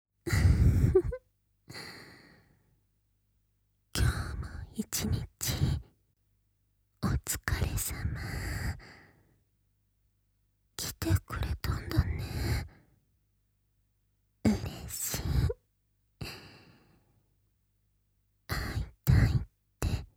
全身マッサージ＆愛撫＆耳舐め

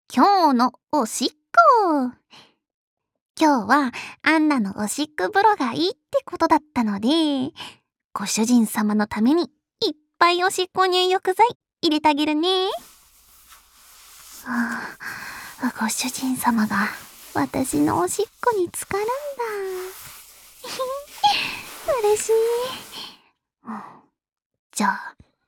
今日のおしっこ 浴槽におしっこ入浴剤

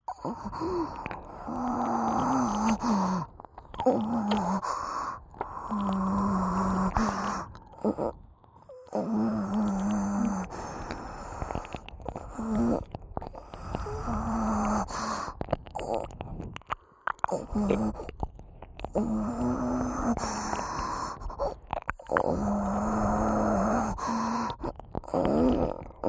Lオホ喘ぎ＋R耳舐め; 耳元オホ声、耳舐めループ; オナサポ

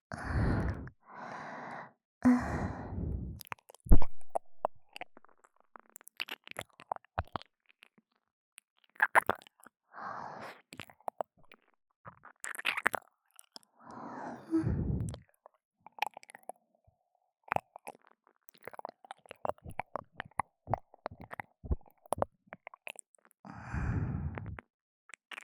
耳舐め／右; ようこそ、癒し処ゆるりらっくすへ

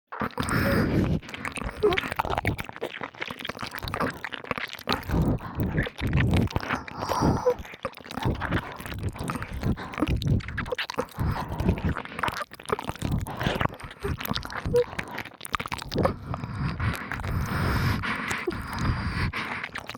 (両耳) 激しい耳舐めエッチ, ASMR; 黒耳; 効果音無し版

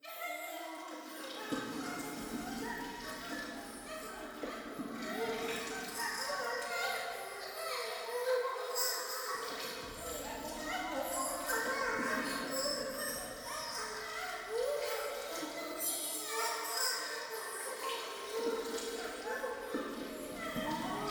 トイレ 喘ぎ 触手

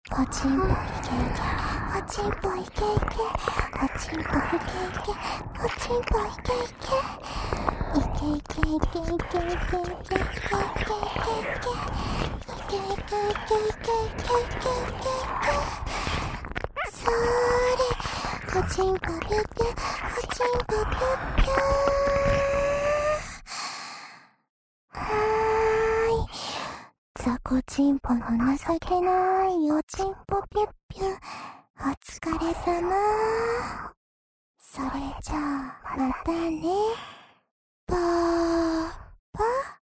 射精しちゃえ♪＋両耳舐め; おまけループ（オナサポ）; 『パパのざぁこ♪ざぁこ♪』

